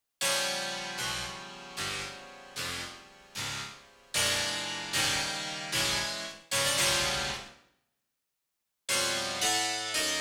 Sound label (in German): Nocturne Harpsichordt; Nocturne No. 1 – Satz 3